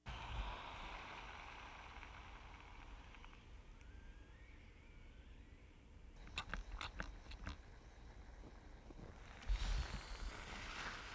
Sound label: Iron Steam Spray